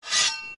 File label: sword draw; oot